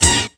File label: Get Points; Inkopolis News